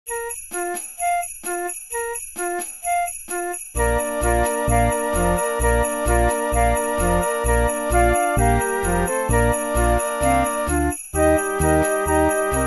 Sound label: Jingle Bells Classic 130